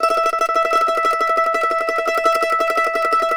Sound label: MANDO TREM E5, Whole Lotta Country; Sampleheads; Whole Lotta Country 1 & 2